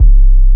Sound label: BASS, 160 BPM; Ueberschall; Drum & Bass Resonance